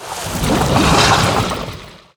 Slime Tank Underlay Play

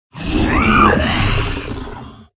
Chromatic Dragonkin Enter Play

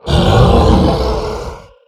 Ultrasaur Attack

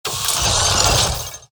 Octo Bot Tentacle Attack

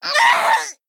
Male Kobold Death

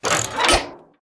Cogmaster Attack Underlay

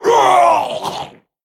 Male Frog Death

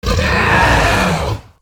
Cave Hydra Attack

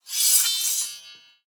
Glaive Large Underlay Play

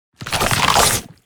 Corrupted Egg Attack

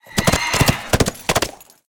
Undead Horse Attack Underlay

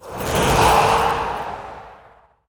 Ice Walker Attack

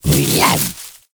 Attack

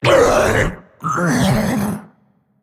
Murloc Dragon Death

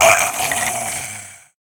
Skeleton Death

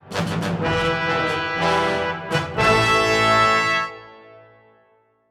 Play Stinger; Dragonbane

